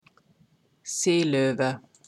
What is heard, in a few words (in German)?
Seelowe; sjopattedyr; sjolove